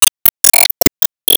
Enemy Onion Active Headshot

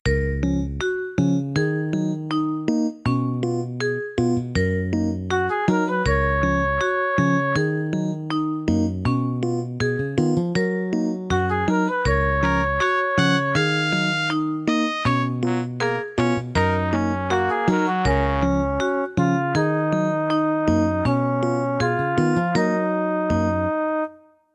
Bgm Loop

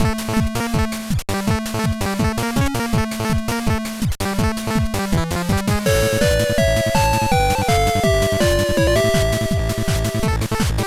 Battle Mode; Shantae Advance Risky Revolution; GBA; Multiboot

